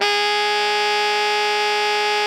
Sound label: ALTO GR G#3, Sax